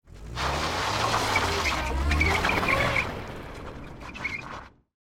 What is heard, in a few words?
Car In Reverse Skid